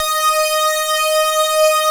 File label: P5 STRINGS; Greytsounds; Scott Peers Sound Engineering